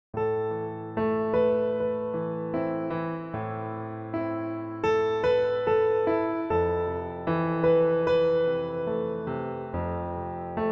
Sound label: 5 Layer Piano; KORG OASYS; The 5 Layer Piano; oasys assault